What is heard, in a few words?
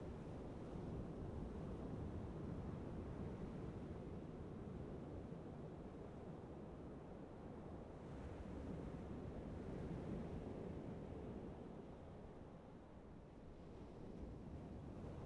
amb map zoomed out ocean